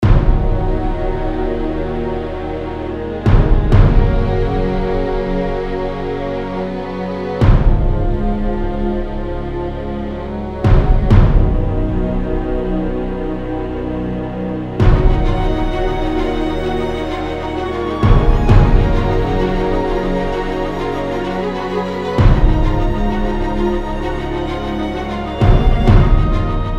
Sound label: Midi